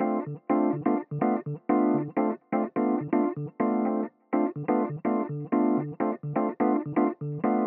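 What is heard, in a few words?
electric piano, 125bpm Dm, Nu Disco; Zero-G; Construction Kits; Speedy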